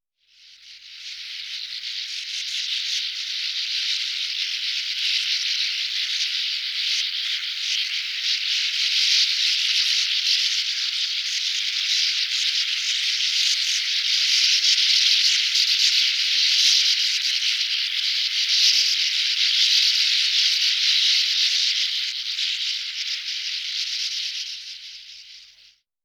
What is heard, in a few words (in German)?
uferschwalbe, gesang; singvoegel; roche 2004